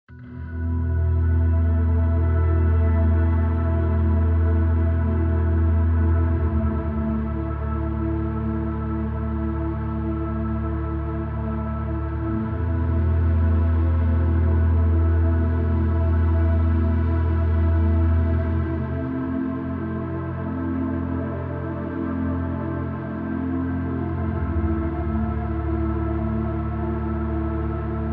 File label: Organ Chords and Atmosphere; Outer Space - Floating; Ambient SoundScapes